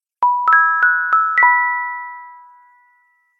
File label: jingle bells sms; message tones